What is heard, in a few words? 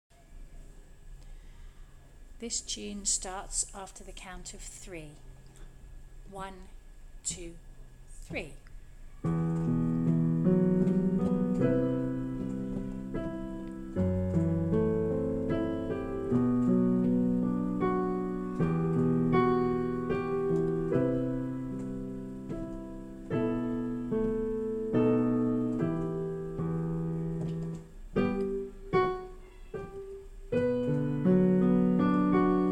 Mystical bird piano part